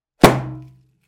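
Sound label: clap sound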